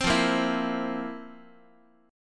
F Major 7th Suspended 4th, Guitar; strummed

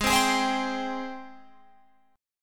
Ab Major 7th Ab down Guitar Open F; AbM7 strummed

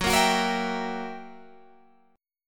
F sharp 7th Flat 9th Guitar Open G, chord

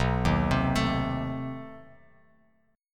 B 7th Flat 9th B down, Bass Standard; B7b9 arpeggiated; JGuitar

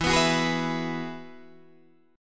E Major Add 9th E down Guitar Open D

